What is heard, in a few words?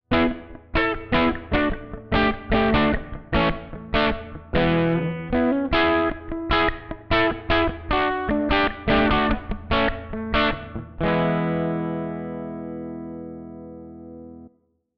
Bassman